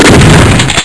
barrel; svencoop; classic; zombieman

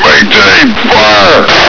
military tank; svencoop; echoes

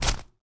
pl dirt, player; svencoop; arctic incident

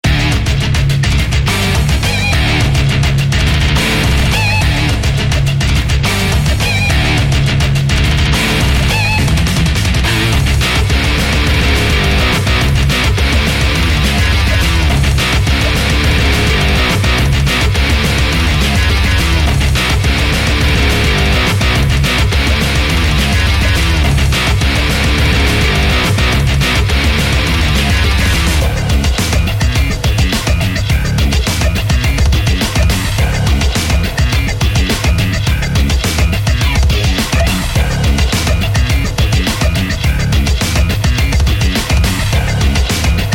bgm neorock loop; maoudamashii; uboa rampage ii

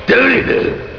alert; svencoop; sin

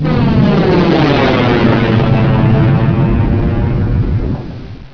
alien flyby, flyer; svencoop; echoes

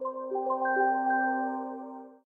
puzzle solved; ragemap2019; giegue

